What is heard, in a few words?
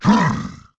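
damage, sheep boss; cow boss; 12zi cow boss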